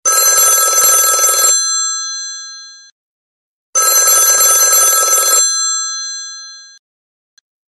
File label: vintage, ringtones